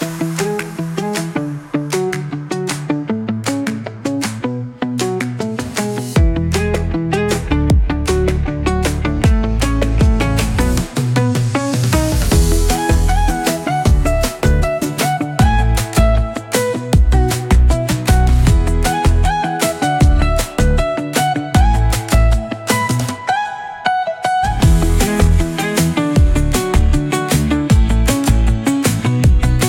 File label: Pop